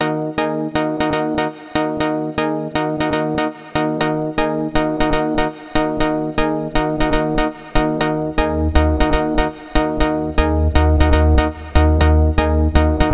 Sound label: synth sub bass; subcolours